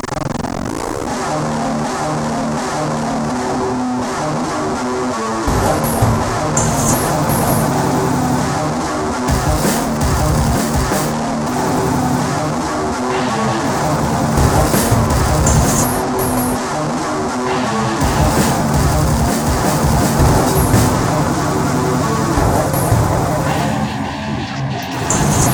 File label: loop; svencoop